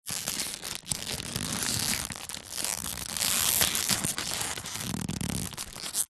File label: foley packet plastic crisps multipack empty squeezing handling; zapsplat